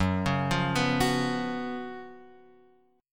Gb Major 7th Suspended 4th Gb Guitar Open F; GbM7sus4; arpeggiated; x,1,1,0,2,1; JGuitar

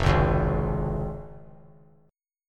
F Minor Sharp 5th, chord; Fm#5 strummed; JGuitar

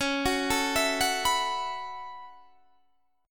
A 6th Add 9th Db, guitar

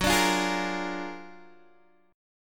Gb Augmented Major 9th Guitar DADGAD

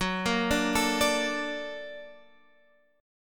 Bb Augmented Major 7th Gb

